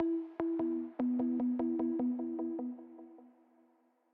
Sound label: Down Level Pluck